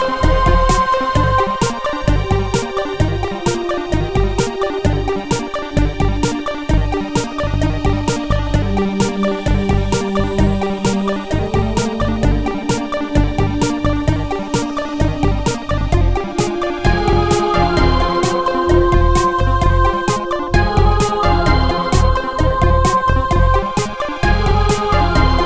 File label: Retro Beats; The Binding of Isaac Rebirth Afterbirth